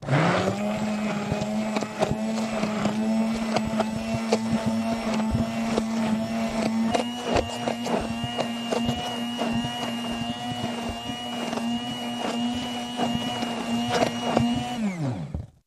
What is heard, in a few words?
Mixer Hand Held Elec, appliances